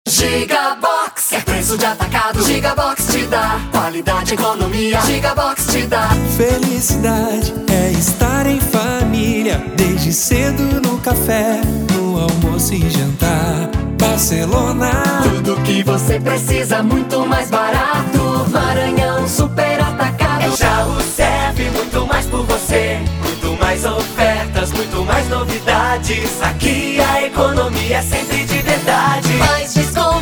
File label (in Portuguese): jingles supermercado; mundi supermercado